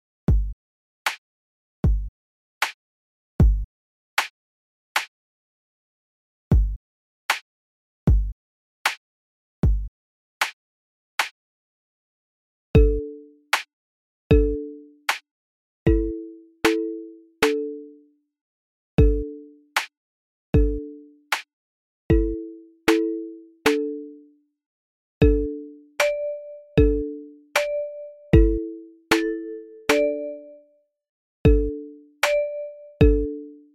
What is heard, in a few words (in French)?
chat instru; animaux